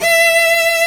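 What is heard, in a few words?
STRINGS SOLO, Lrg Ensemble; Roland L-CD702; Combos 4; Chamber Grp2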